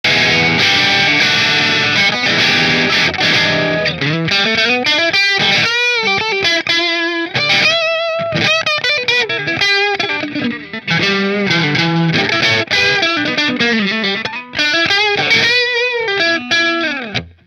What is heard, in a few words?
Robin tonemonger position 4 marshall, guitars